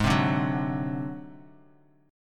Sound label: Ab 7th Flat 5th Ab down Bass Standard; Ab7b5 strummed; JGuitar